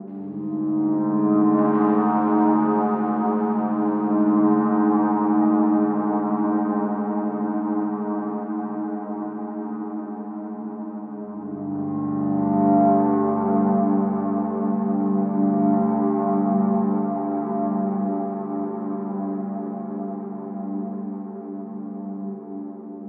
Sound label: Scan Sequence Loop; soma gamerip 2015